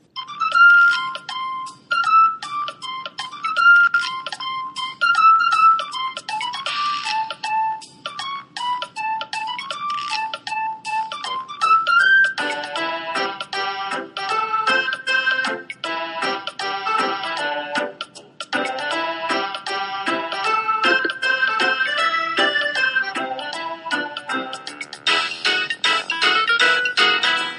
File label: sms; tiny toon